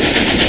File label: train; Chase Hollywood Stunt Driver; XBOX